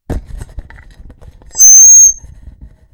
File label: Microphone Feedback; Fairlight Sound Lib